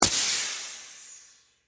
Air Release Valve, Industry, Air, Release, Air, Compressor, Valve; SoundEffects-BlastWave